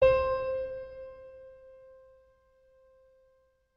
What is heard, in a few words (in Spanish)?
do agudo, musical notes; websim